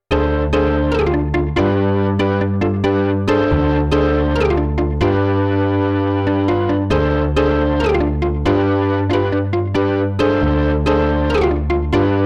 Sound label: Org Perc